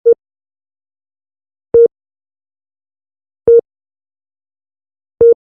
beep high simple

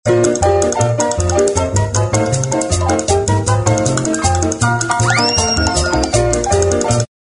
honky tonk piano

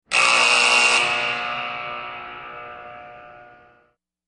Alarm Buzzer

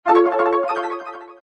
mystery beeps

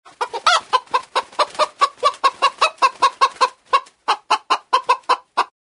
birds chicken playing chicken

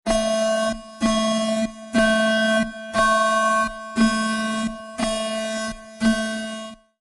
Computer Alert Tone

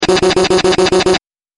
twisted machine

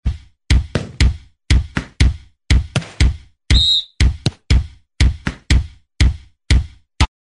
soccer kick beat

